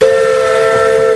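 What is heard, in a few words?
whistle fairlie, narrowgauge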